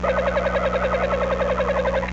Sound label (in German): Water Glucke